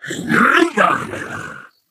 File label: ballbot fist pump